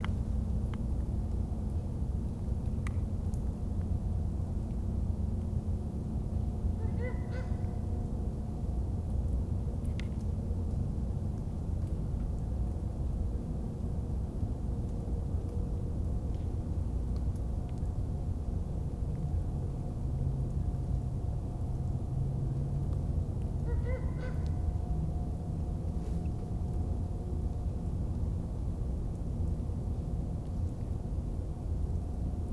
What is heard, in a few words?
Great Horned Owl